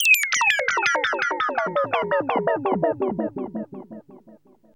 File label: ECHO